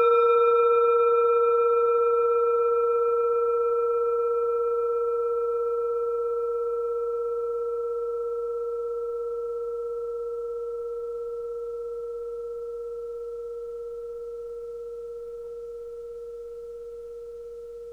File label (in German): kleine klangschale